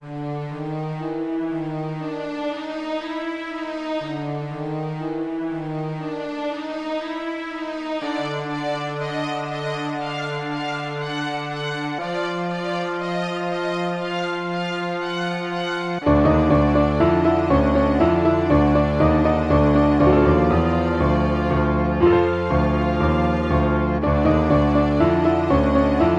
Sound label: piano; pianoterror; wolfenstein variation